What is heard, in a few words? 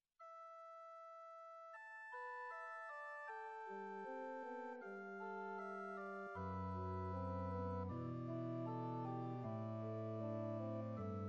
Passion Chorale